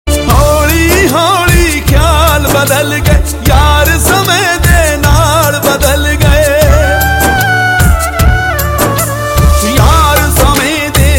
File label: Punjabi Sad Tones; Ringtones